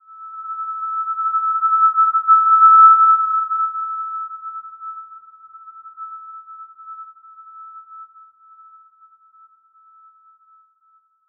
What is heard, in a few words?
Simple Glow E6 p; HSS-Custom-1; healing soundscapes